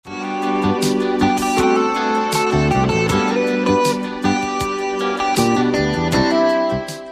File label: electric guitar